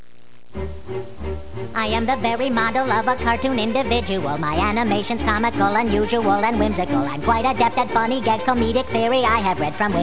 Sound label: cartoon; FUNGAMES2